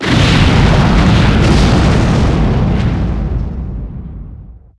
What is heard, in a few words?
Nuclear Bomb; Net Power 2000 #2